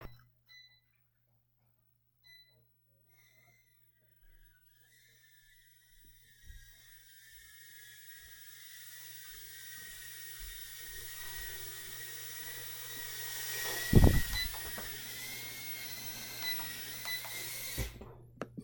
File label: Drone